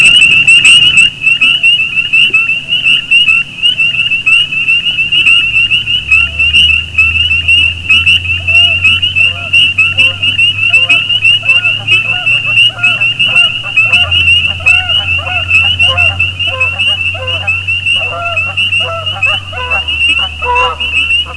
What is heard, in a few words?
spring peeper, frogs